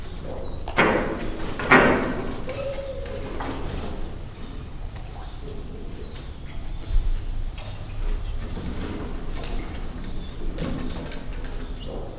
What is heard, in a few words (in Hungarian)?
és a hasmenéses ibisz, törpe flamingó; debreceni zoo 2012; marantz puska